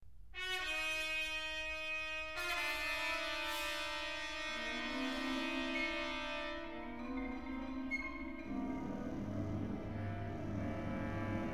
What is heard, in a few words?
turbulence for orch